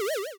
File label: boing